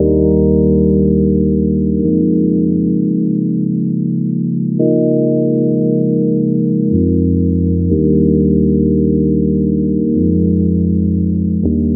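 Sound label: funky chill groove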